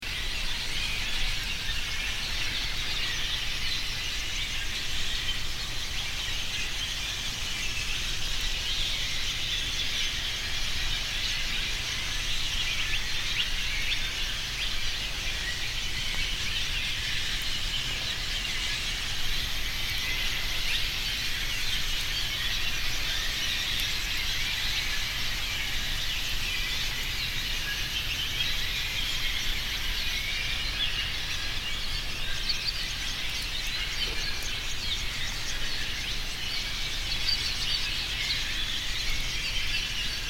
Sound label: Carlsbad Cave Swallows